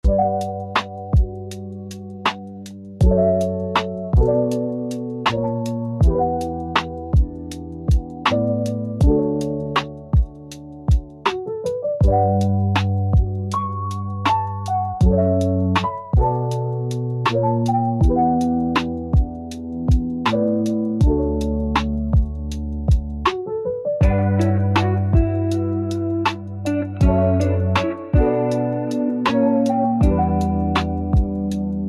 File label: warm breeze lofi music chill lofi